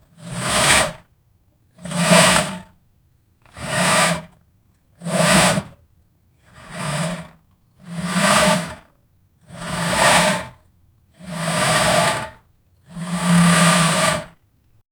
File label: to drag a load across